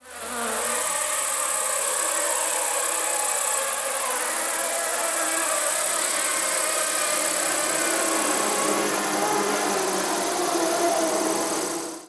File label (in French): abeilles